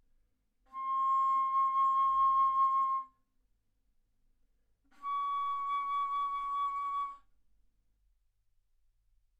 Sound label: Bass Flute C6 Db6; ff; single mic